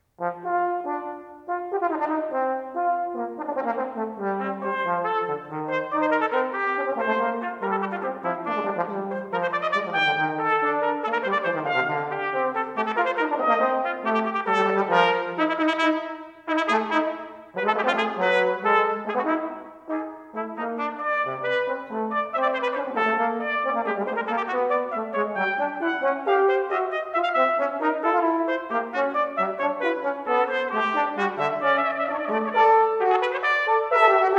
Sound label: duet